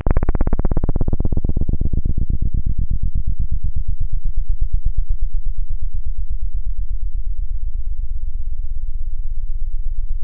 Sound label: BASS; ZERO-G; Datafile